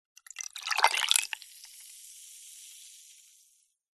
food drink soda cola small amount pour into glass ice fizz; zapsplat